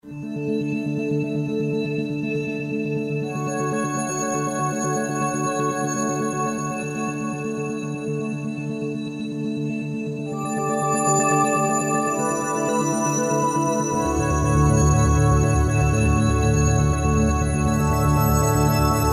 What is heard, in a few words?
Twinkling String, Roland JD-990; Factory Patches